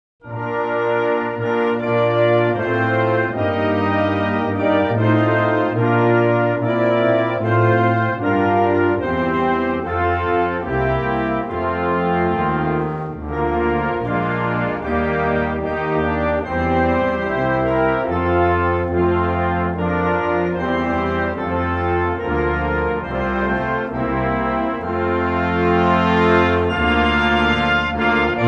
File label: ANTHEM Antigua and Barbuda